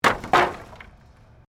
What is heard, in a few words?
Wood Planks Impact Wood Wire; Materials